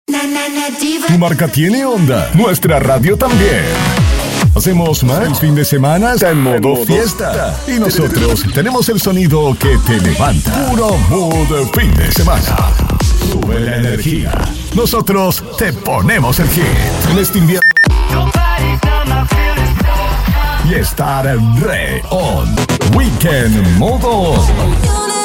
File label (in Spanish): Arte Cool Neutro Invierno; invierno 2025